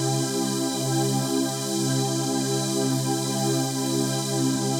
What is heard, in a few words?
Pad Loop C, shimmer and sparkle